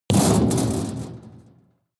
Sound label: metal impact sheet bright rattle hard; hits explosionen; metall